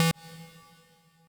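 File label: square lead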